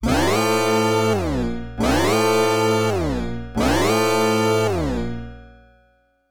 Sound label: Warning; prajator image ranger pc-8801 opna